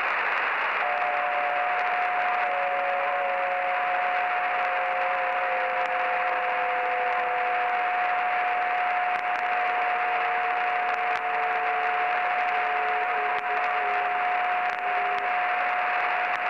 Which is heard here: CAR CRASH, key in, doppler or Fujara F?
doppler